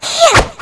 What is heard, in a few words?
attack